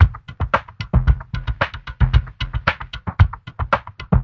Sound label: wave drum lofilts, 113 bpm